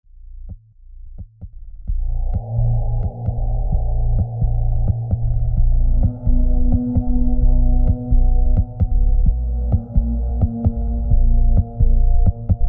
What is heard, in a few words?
The last heart beat Ambience; Next Day Survival